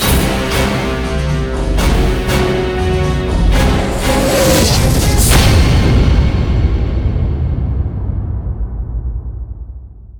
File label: Mission Complete; City of Villains soundtrack